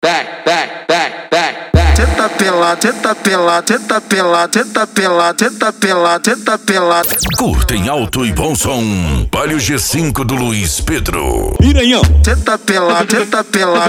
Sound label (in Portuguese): funk mega bass e forro; urupa ro